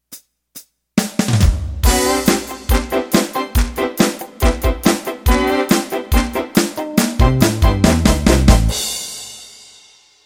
Country Boogie Intro

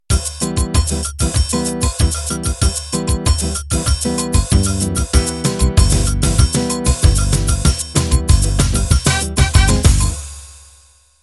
Samba De Sol Intro

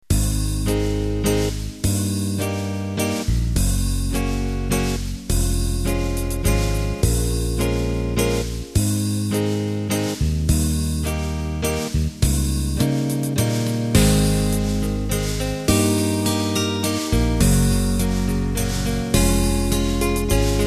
Waltz

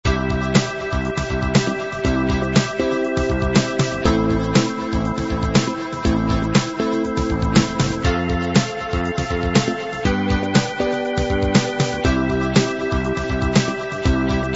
fatback groove

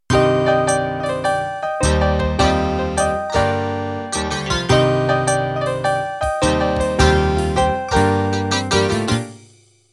Rockabilly Intro

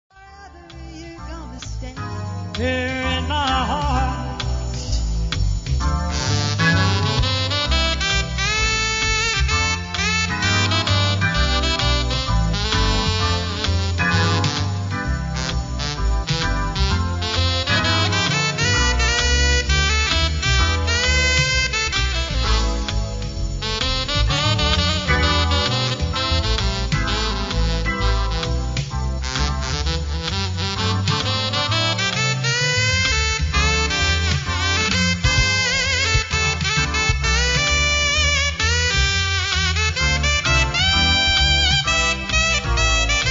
sunshine sax

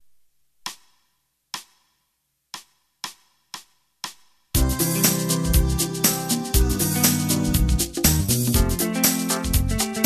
Smooth Jazz

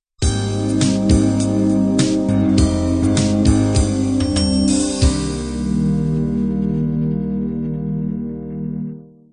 color beat end

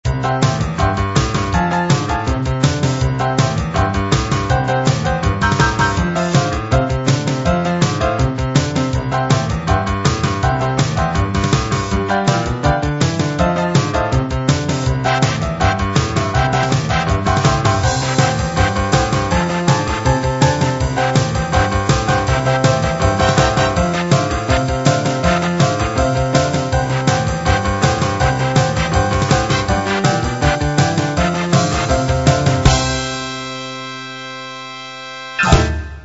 boogie

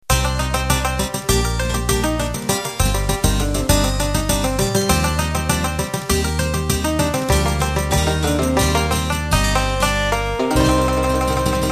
Cajun Country End

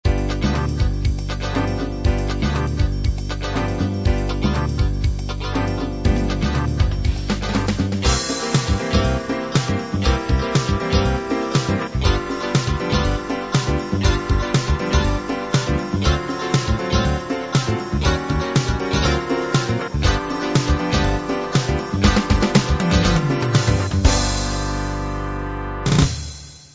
prog rock

